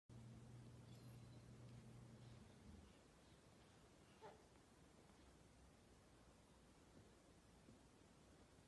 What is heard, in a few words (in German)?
Kind mit Hund